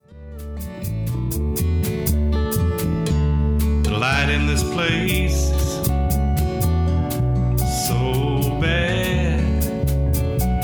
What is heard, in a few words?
Strummin On My Blue Guitar